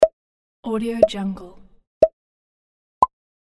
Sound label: countdown pops 3 2 1 go